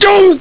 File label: doh; Viper